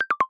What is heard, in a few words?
Push To Talk Left, iOS Sounds